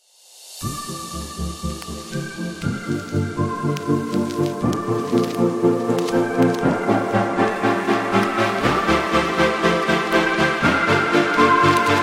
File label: Chill Trap Lounge